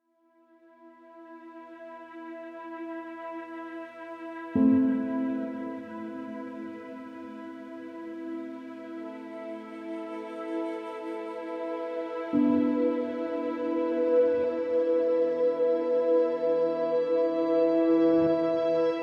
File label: Sad Storm; There Is No Game: Wrong Dimension